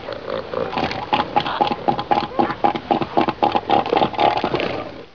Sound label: seal sounds; marine animals; Many marine animals make sounds and songs